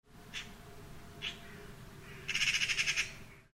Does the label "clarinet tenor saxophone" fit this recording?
No